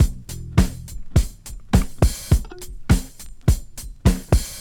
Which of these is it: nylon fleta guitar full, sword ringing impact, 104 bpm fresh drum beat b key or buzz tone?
104 bpm fresh drum beat b key